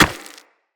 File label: Run Rock, footsteps; Operator